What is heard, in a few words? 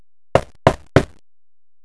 knock; AngelTearsv3; AndromedaSky